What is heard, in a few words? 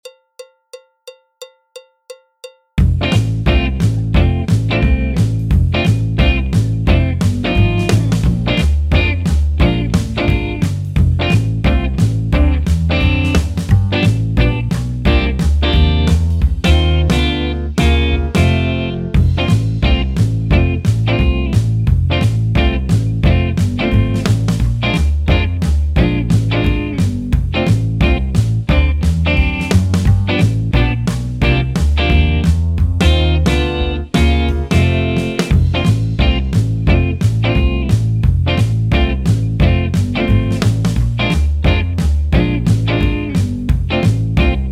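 backing track, Jazz; alto, trombone, piano; Jazz Syllabus Level 3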